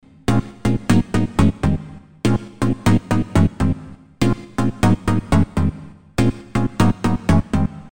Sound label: Loop